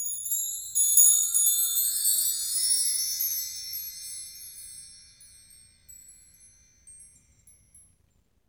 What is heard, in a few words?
PRC MARKT, Marktree; Roland LCDP03 Orchestral Perc; Wind Chimes2